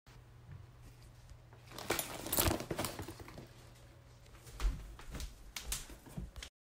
cam lay nhat tui xach len

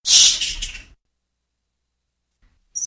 tieng doi co don keu

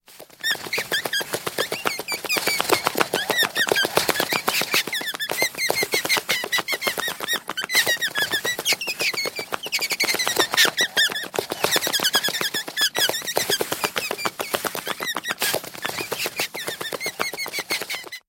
mot con doi bi mac vao day va khong the thoat ra duoc

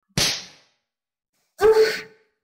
tieng vo mong va tieng ren

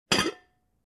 mo day nap chen bat hu bang su

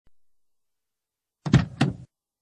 tieng dong cua xe o to nhe nhang

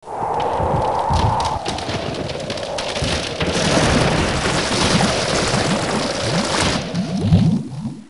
Am thanh phat ra khi Con Tau Chim

